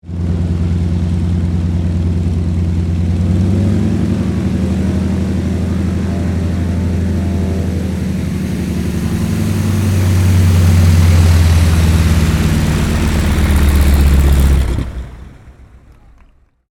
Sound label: AIRCRAFT AT 6D HARVARD MK III TAXI SHUTDOWN; Harvard Training Aricraft; Airplanes; Vehicles